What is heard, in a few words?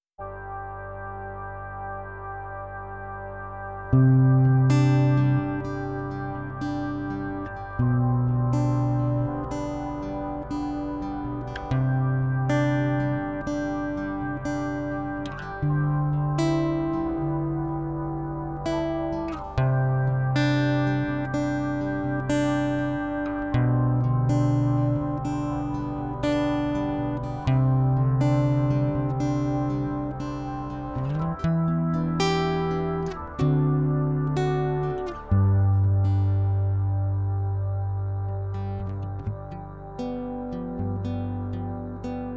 guitar; SnootGame; dinolove